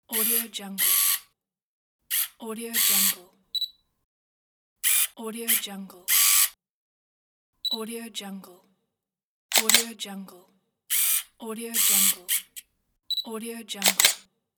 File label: camera shutter; thenovard